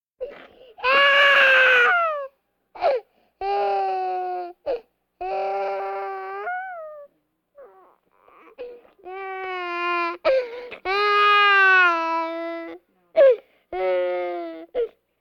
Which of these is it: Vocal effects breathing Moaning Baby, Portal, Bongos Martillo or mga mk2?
Vocal effects breathing Moaning Baby